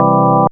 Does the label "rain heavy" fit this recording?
No